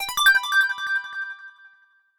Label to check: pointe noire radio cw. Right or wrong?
Wrong